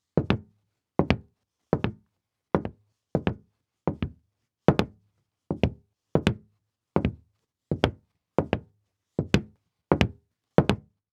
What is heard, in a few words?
Foley Footstep Cowboy Boots Walking On Hollow Wood Surface; Foley Footsteps